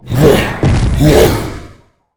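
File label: iceguardian spawn, monster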